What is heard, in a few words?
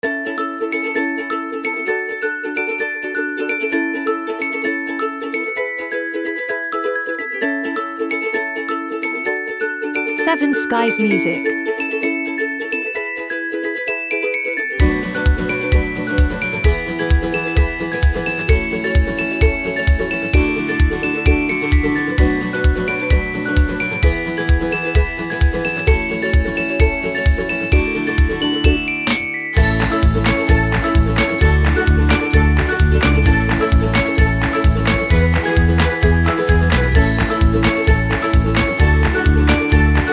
Happy Upbeat